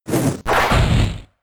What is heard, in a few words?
Kick; Blaze Kick